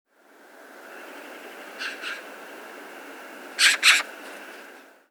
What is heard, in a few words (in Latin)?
Pica pica; SETE